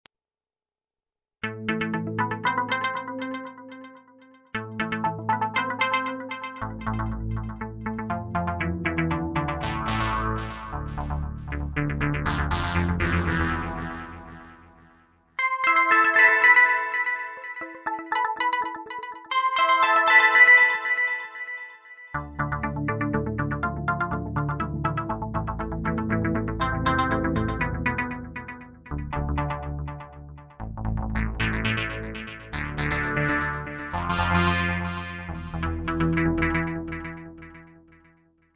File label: synth bass FX